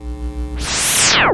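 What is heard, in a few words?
laser gun effect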